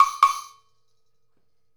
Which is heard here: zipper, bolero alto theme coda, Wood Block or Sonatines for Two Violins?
Wood Block